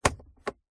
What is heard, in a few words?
vehicles car luxury glove box open